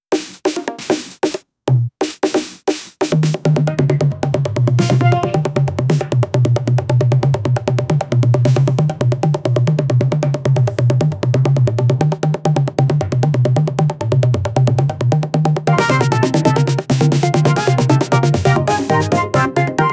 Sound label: tabla with drum beats